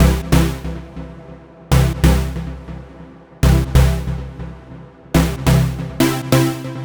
Droid Chord, dubstep

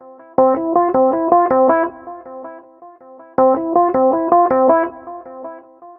Single EP; downbeat

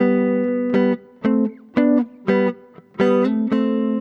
B, jazz

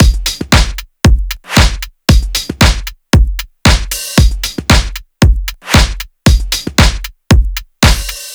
Funky Elec

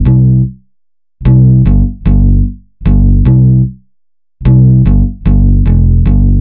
Nonchalant Bass, reggae; регги

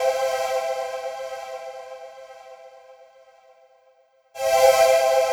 Formant, hip hop

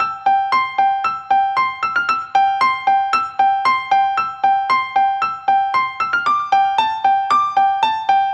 Piano Melo

